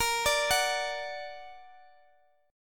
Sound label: D Augmented, A sharp